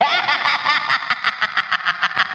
laugh cartoon

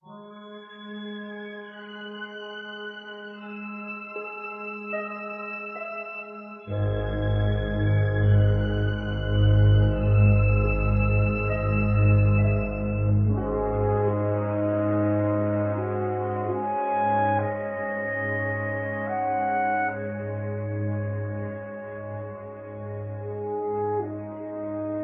strings, Loops, Sad

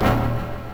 lick me, Horns; Notes

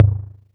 wavy, Toms Electro

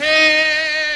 "hey", Voice

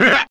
"huh huh"; Voice; ooh ahh

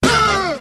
"ah"

